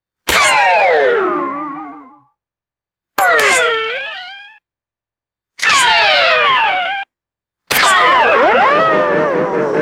stylized cartoon bullet ricochets

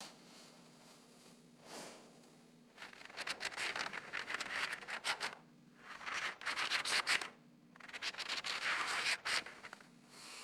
slow crisp paper page turns

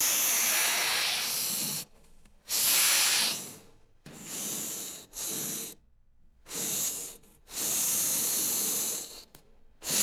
constant hissing air suction tube

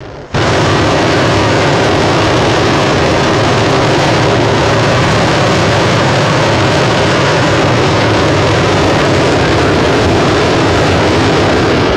continuous rocket flight thrust loop; aircraft

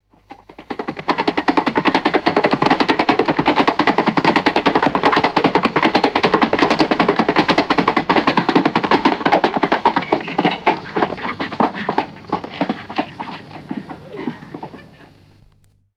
horses trotting to a stop; footsteps

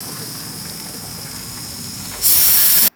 short squealing hose pressure release; air

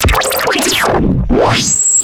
futuristic digital tech sweep and stinger

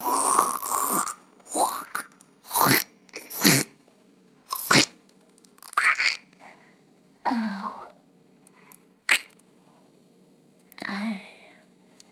wet noodle slurping and sucking

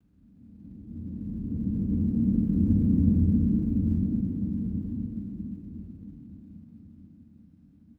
smooth low frequency designed whoosh; swooshes

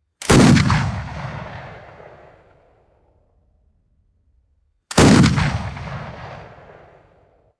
vintage muzzle loading rifle gunshots

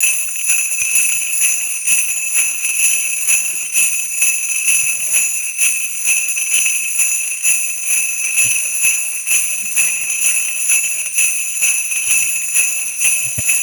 steady rhythmic sleigh bell jingling

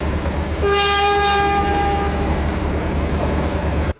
short resonant train horn blast; trains

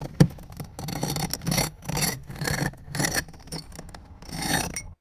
scraping crunchy ice off a windshield

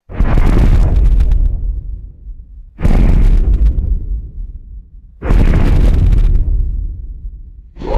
three deep distant sonic booms; explosions

